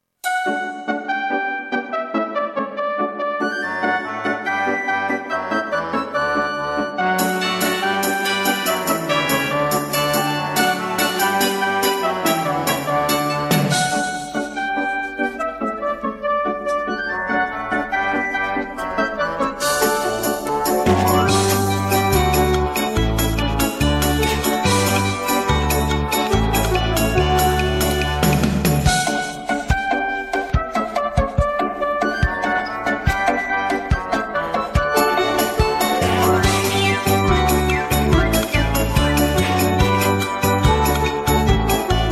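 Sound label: backing track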